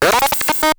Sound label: Mechanical